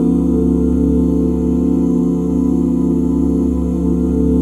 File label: OO C# SHARP9, OOH CHORDS, Vocal Jazz; ILIO; Vocal Planet; Jazz & FX